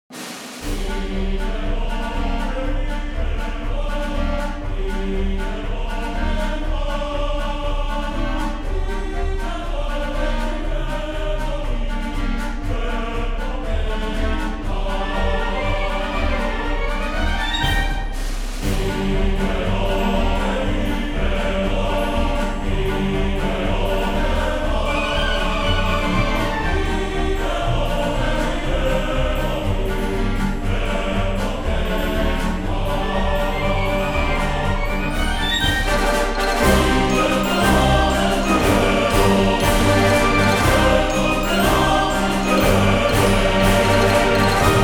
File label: Soviet March